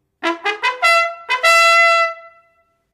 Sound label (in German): Ruf 3. Eskadron, Kavallerie